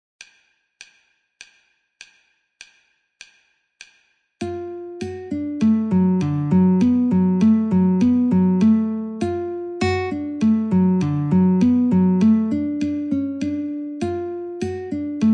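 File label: Chicken Reel D Guitar metronome 50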